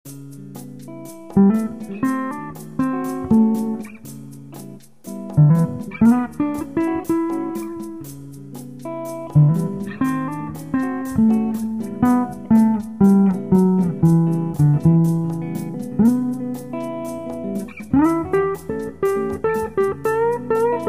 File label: Drums; Jazz Demo Song